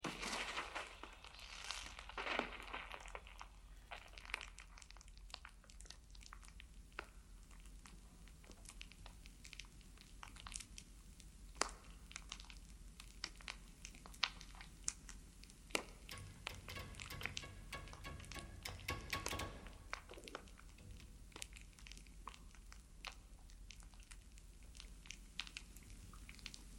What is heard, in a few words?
six seasons solos darkness piano; instrumentation lab